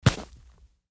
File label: Snowball Hit